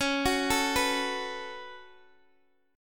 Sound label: C sharp 7th Suspended 4th Sharp 5th, C sharp, down, Guitar, Open G